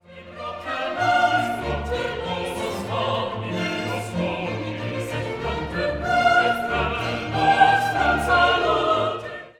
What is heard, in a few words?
Credo Qui propter